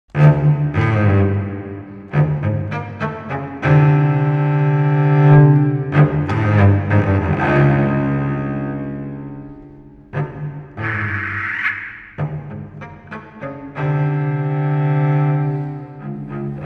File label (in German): Allegro con fuoco aus Sonatine in h-moll